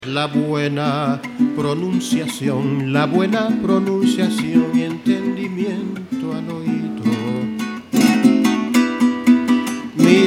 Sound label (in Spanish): Sonidos Cuatro Polo en Sim